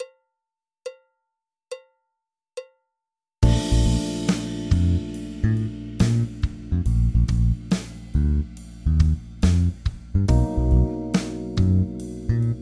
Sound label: MAJOR 2 Bars 70BPM, saxophone play along major; major scale and arpeggios; saxophone masterclass; major improvisation